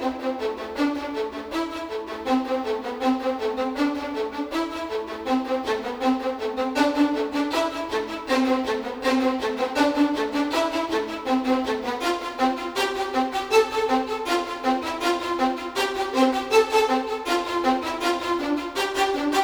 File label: violin